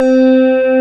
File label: MOOG LD; Greytsounds VOL-1